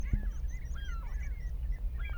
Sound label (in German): Schwarzkopfmöwe Trischen